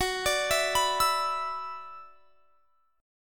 F sharp 7th Suspended 4th Sharp 5th, guitar; F#7sus4#5 arpeggiated

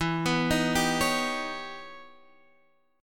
E Minor Major 13th E; EmM13 arpeggiated; x,7,9,8,8,9

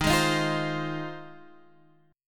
F Minor 7th, Eb

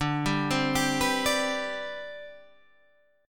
C Major 7th Suspended 2nd D, chord

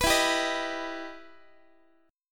E Minor Major 7th E down Guitar; EmM7 strummed; x,x,14,14,11,14

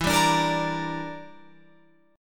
E Minor 7th Flat 5th, Guitar, Open F; strummed; 16,14,14,17,17,17; Em7b5

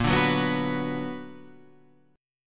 B Minor Bb, Bm/Bb chord, guitar; strummed

